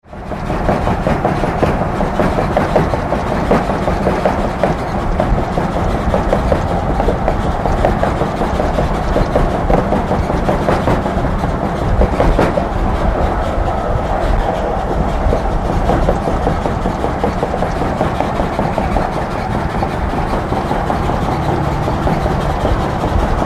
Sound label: Cargo Truck Interio